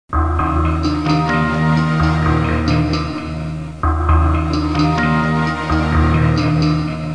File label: RHYTH, LOOPS